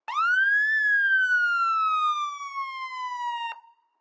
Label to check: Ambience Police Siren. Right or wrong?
Right